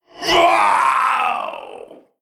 Male Giant Death

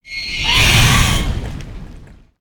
Firehawk Form Attack

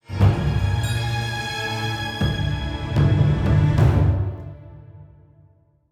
Play Stinger

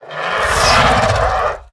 Plagued Hatchling Play

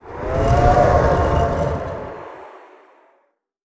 Meeting Stone Attack

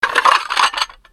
Plate Underlay Play

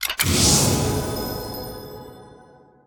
Mystery Box

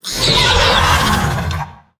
Frenzied Felwing Play

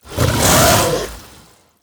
Midnight Drake Attack

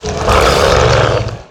Scaleworm Play

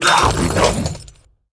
Toothy Chest Play

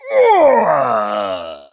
Chasing Trogg Death

